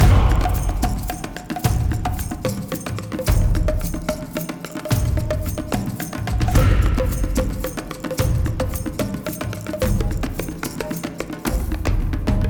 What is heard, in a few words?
Garden Main